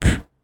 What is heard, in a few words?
miss